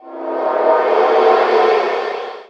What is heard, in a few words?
earth of death cast